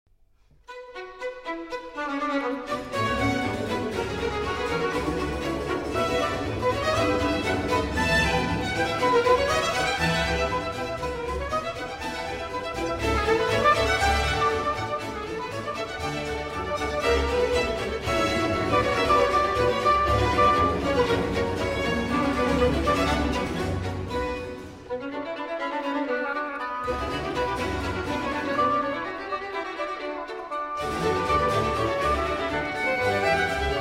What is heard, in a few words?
Concerto for violin and oboe in C Minor, Allegro; BWV 1060R